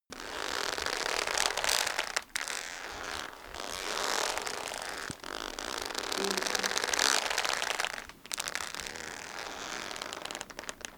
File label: leather leather boot creak